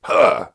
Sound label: Attack; Voljin